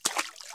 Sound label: spring step; Original TK Sounds